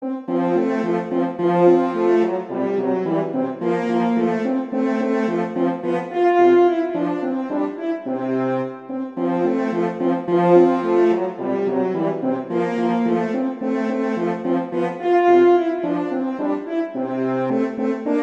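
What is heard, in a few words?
Duet, horn; Mozart; Duets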